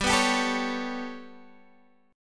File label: B 7th Suspended 2nd Sharp 5th, chord